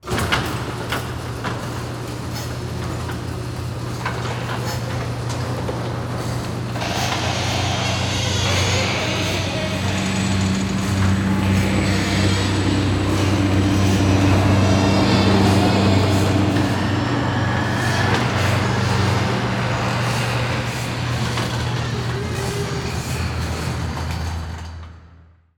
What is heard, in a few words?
Emergency Door Garage Door Of Fire Station Open; emergency effects; AdobeCC